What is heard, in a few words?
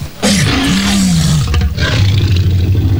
trex